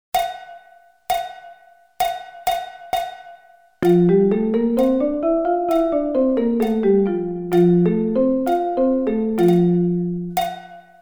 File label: F Scale